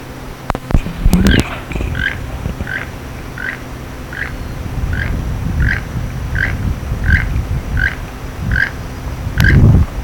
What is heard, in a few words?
keel billed toucan, Belize birds birdsongs